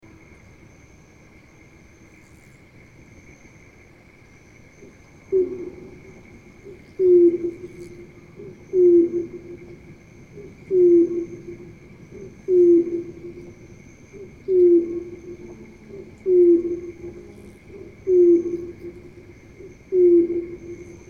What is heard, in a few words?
heron filtered; Bijarim-ro